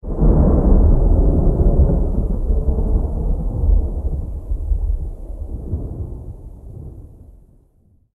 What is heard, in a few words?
Distant Thunder Rumble; gamerip; escape from russia mobilization